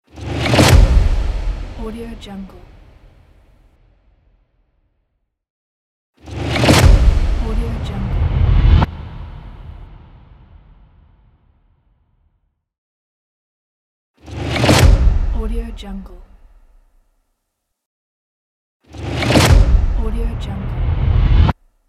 epic impact

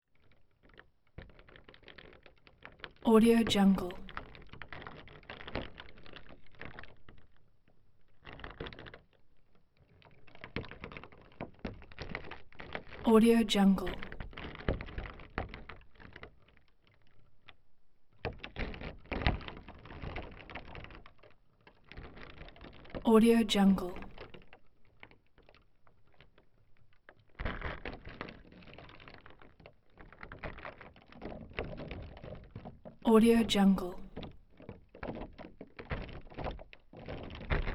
wood crack gritty crackling scraping; prosoundfx

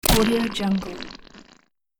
cartoon goo game hit

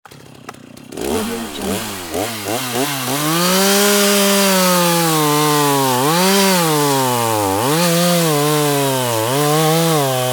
gas chainsaw cutting wood; sound-ideas

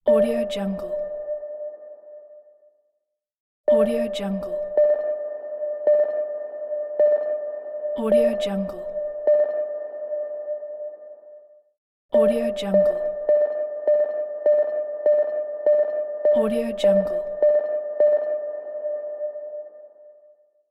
underwater sonar signal pack

